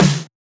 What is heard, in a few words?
Snare, trap